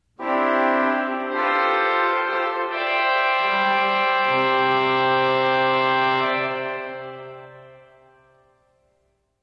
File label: Tuba Major